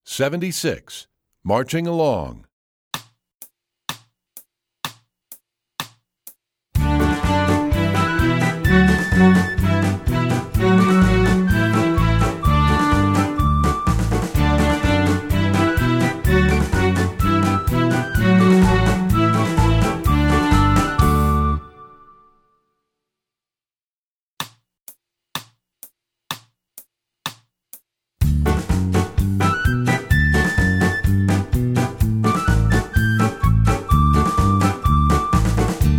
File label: Marching Along, Strings; EE2000 Book2